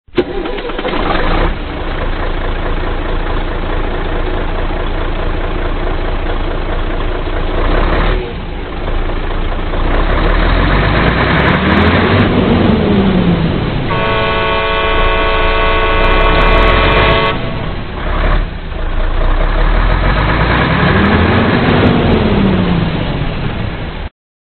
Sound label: motorsound horn, trucks